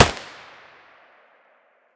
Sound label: mp5 muzzle mono distant, weapons